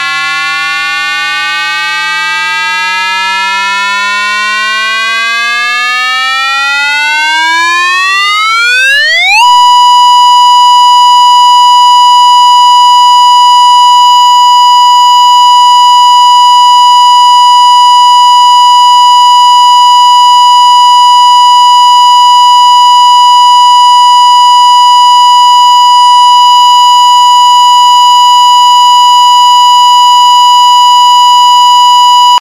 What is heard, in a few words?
special warning